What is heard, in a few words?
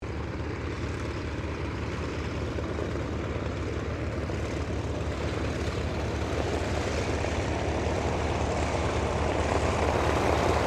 AIRCRAFT DEHAVILAND DHA-3 DROVER TAXI, tri engine; Airplanes